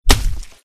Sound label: impact blunt